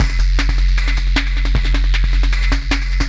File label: Dirgedriver Beat, Drum N Bass; Sonic Foundry (Sony Creative Software); Knutrix Drum & Bass